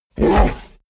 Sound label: fishman groan short; kreaturen